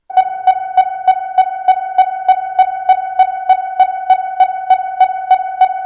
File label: fast sonar